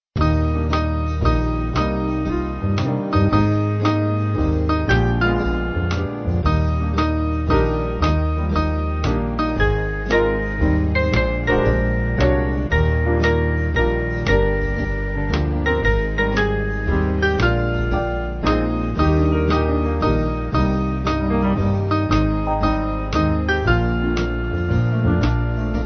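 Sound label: Band, Music Backing Files for Churches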